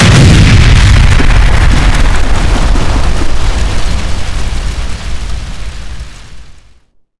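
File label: building big explode; Explosions; cod modern warfare 2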